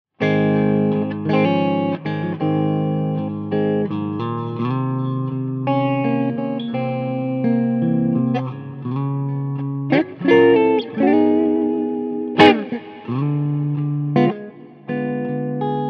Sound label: Clean breakup Gibson Les Paul Custom